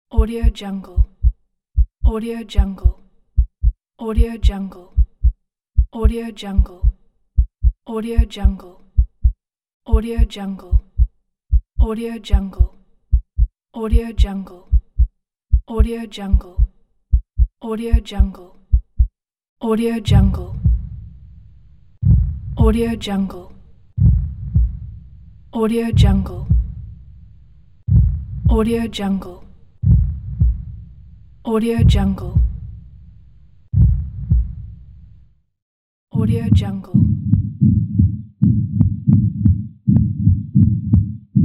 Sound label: heartbeat pack